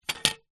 technology microphone stand adjust movement

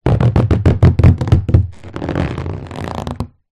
balloon rub squeak on wood; zapsplat

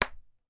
sport table tennis ping pong bat hit ball

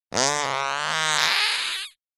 human fart squeezed squeaky; zapsplat

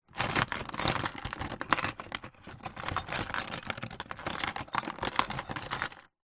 industrial tool box plastic rummage

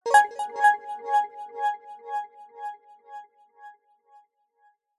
multimedia game sound nature game generic

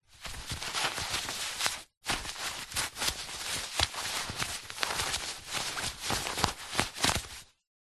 foley fabric thick fast heavy movement; zapsplat

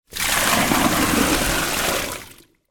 household water pour from bucket into drain

